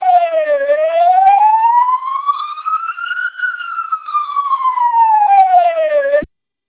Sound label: siren